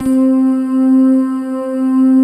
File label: SOFT PLUCK RS, pads; Pro-Rec - Synthsonic